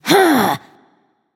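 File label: grunt attack, female; smite; voicepack; hera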